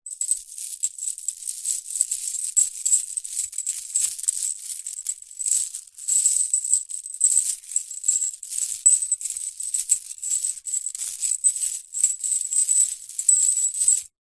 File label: animals dog collar chain handling